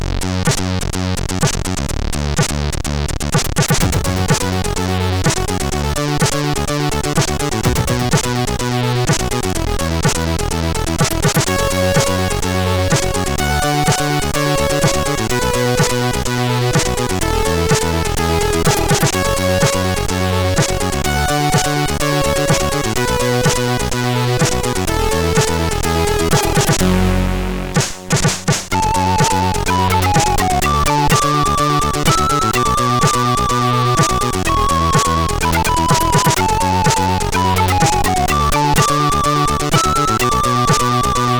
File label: Instrument, CSG8580R5